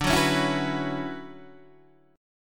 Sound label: D Major 13th Guitar Open D, chord